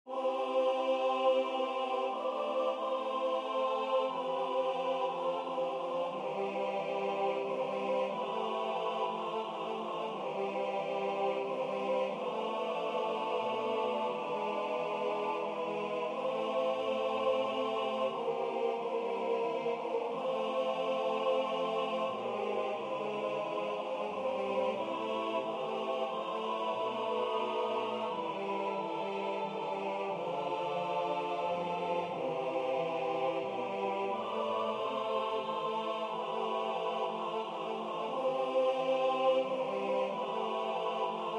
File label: Tenor